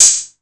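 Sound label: 808 open hi hat; Wizoo - Platinum 24 Electronic; 808 DRIVE P1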